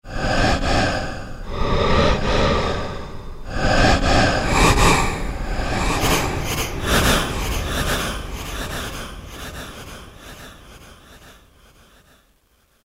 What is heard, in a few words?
Breath Fx x4; Roland M-DC1